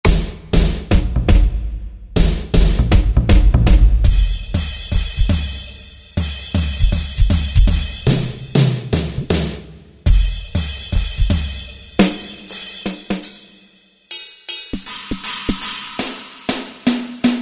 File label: Total Kit; Korg TR-Rack